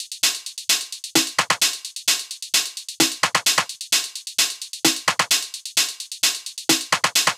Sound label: Techno Kit 130bpm Top, Drums; EDM Drums; Drum Kits; Stems; Ghosthack; Drum Mega Bundle; Ultimate EDM Drums; Techno Kit 11